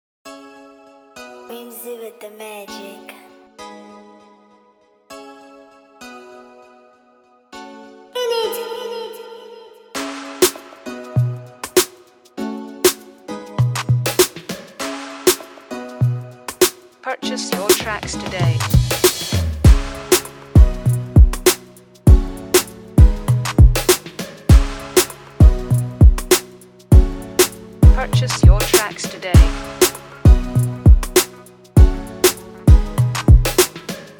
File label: Afrobeat Instrumental